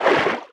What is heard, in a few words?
creature baby penguin swim slow